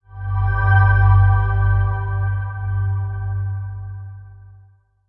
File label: sting box open